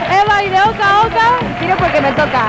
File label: oca a oca, Oca call and response; This is a tradition from the original board game